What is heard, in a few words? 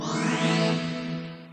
twiing; BALLY; CORVETTE; Son Corvette Original